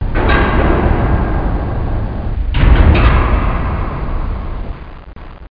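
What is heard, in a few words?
door library stairs up; Myst Preview; DR Lib Stairs Up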